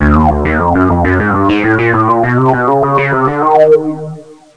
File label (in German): BLATT